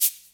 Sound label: shaker percussion F# key, Royality free shaker hi hat tuned to the F# note